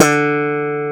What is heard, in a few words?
SNAP D4, BASS; AKAI S-Series CD-ROM Sound Library VOL-2; MX.BASS